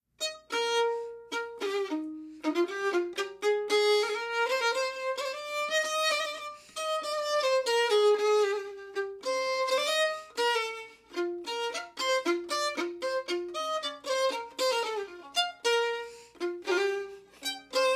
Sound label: Violin; assassin s creed syndicate